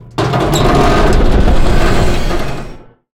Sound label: objects, World of Tanks